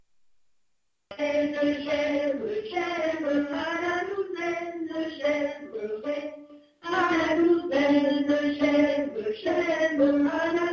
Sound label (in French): amoureux alti 2; On dit que j'ai 2 amoureux